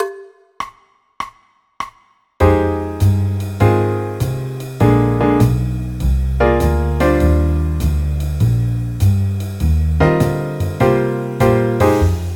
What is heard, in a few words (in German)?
eb dur akkordverbindungen